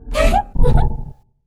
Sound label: monster